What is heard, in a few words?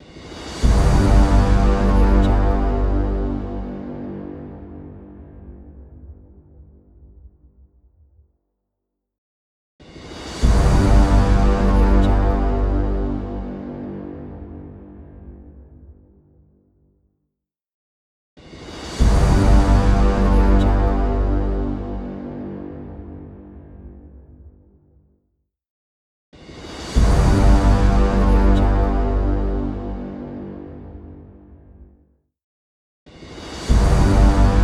trailer hit logo; v8v